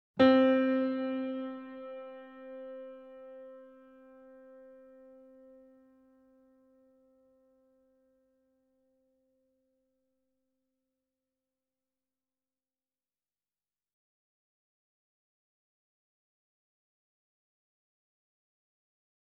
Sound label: C4 E4 A4 Grand Piano & Effects; Sound Sampling Collection VOL-3; Grand Piano 88